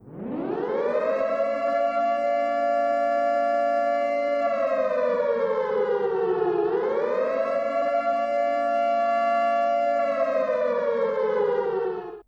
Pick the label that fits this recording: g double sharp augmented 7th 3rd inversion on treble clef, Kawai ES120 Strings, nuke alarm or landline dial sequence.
nuke alarm